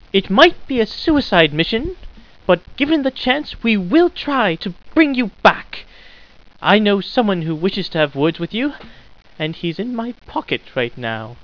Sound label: ahh, scientist